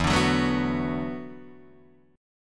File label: E 7th Suspended 2nd Suspended 4th, Guitar, DADGAD